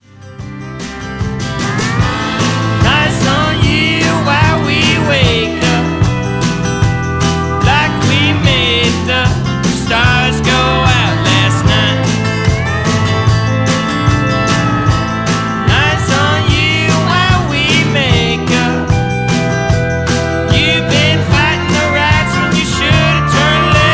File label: Country Tune